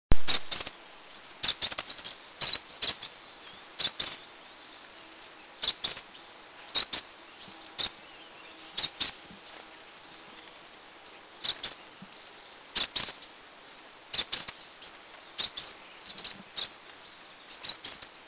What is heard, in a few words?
Green-tailed Sunbird; Eaglenest; India